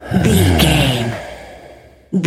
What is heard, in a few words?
voice male breath sigh